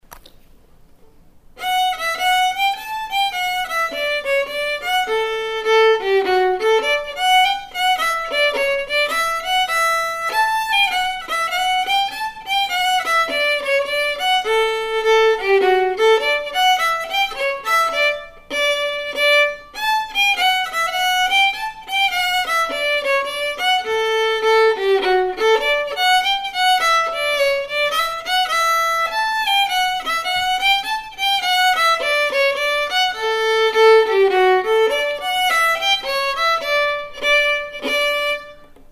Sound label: Hornpipe, fiddle; Dorsetshire Hornpipe